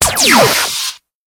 ray, combat

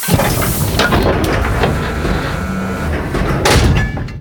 board ship; ships misc; CosmicRage

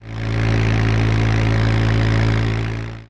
overtake, highway, oldcar

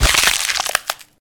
break, combat

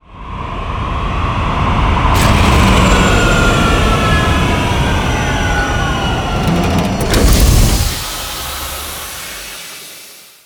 land; planetchunks; CosmicRage

